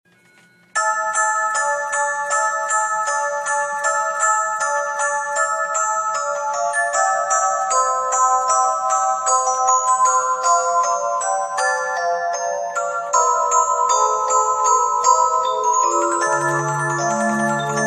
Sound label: muxic box; porter